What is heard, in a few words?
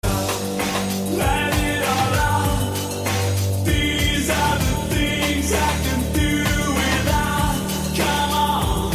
shout